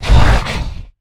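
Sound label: Stealth Attack Grapple; Evolve; Goliath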